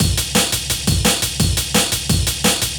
breaks, Loops; M8

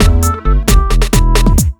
2 Step 133 MIX, House

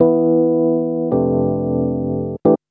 D&B Keys Riff; neuro

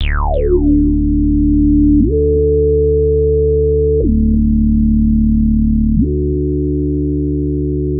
NRG Lead, trance; Sonic Foundry (Sony Creative Software); Trance NRG VOL-1

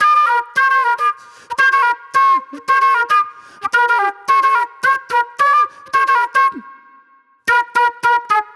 Raw Funk Flute

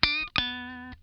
lick scale, funk guitar; Zero G - Funk Guitar

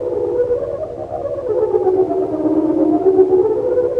FX, Futurist Drum & Bass

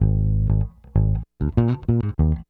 Bass, 70s Analog Funk

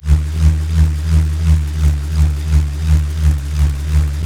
bull, bullroarer, primitive instruments

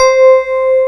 FM RHODES; AKAI S-Series CD-ROM Sound Library VOL-2

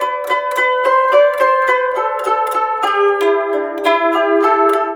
SITUR, SITUR PH; Spectrasonics; Heart of Asia

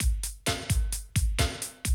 130, Classic DrumLoops

